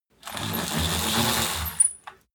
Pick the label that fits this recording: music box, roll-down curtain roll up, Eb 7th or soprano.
roll-down curtain roll up